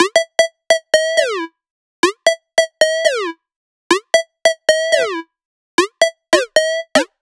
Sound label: 128BPM Melody; Vengeance Samples; VFH1 128BPM Roboto Kit